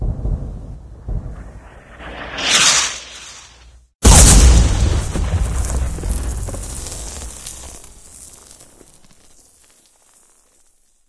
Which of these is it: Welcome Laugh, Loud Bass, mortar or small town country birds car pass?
mortar